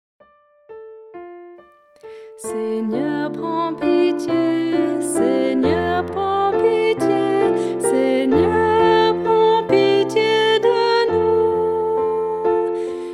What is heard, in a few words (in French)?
Preparation Penitentielle, Soprano; Messe De L Agnus